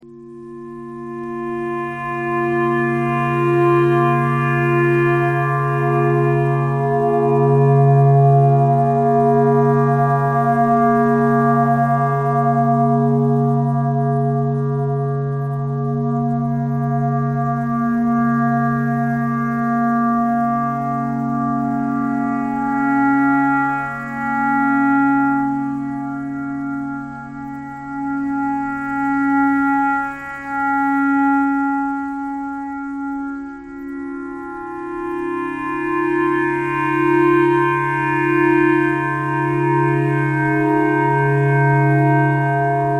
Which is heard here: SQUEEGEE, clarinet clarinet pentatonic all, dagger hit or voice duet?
clarinet clarinet pentatonic all